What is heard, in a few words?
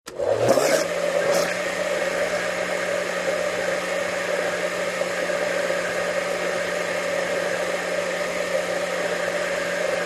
Blender Med Liquid Sl